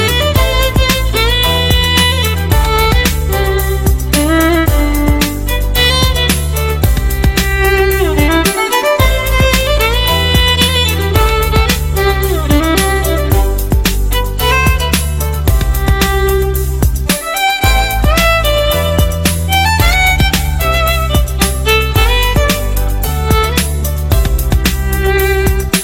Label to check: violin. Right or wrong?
Right